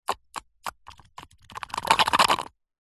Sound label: human drink slurp cola through straw; zapsplat